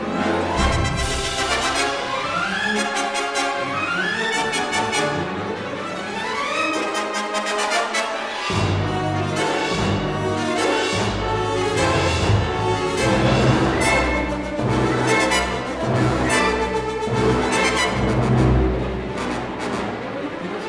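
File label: battle loop, music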